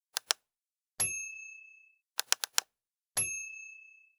Click

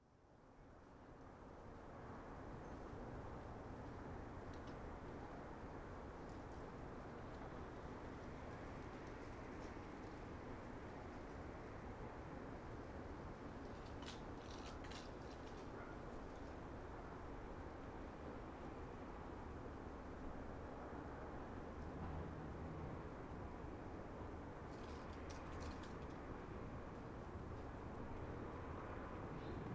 Blustery Cold

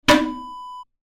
toilet seat cover down

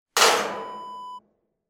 water tank lid close

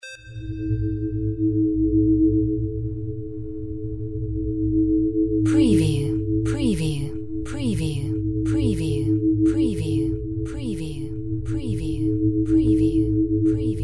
DRONE LFE VENT GHOST PULSE FX

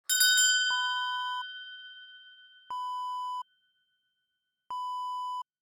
handbell

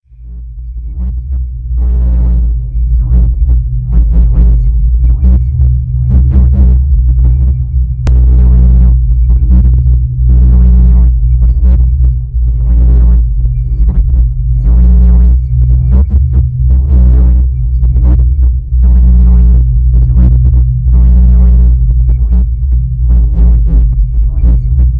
Drone Idle

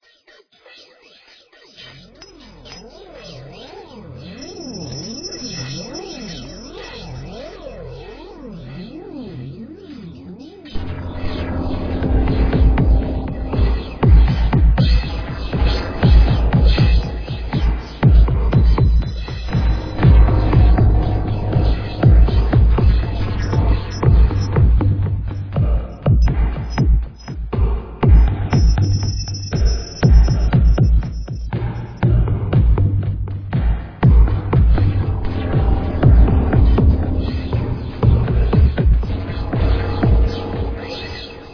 Industrial; Triond

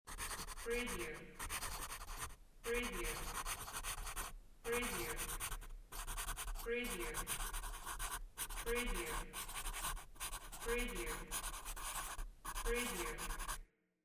pencil writing

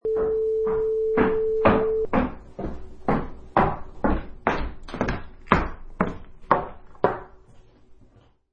High Heels on Wood Floor

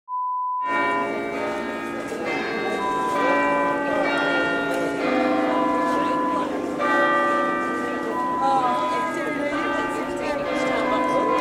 Church Bell sound

